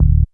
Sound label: BAS TON; E-Lab; X Static Goldmine 2; akai